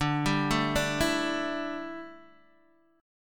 D Suspended 2nd Suspended 4th D down Guitar DADGAD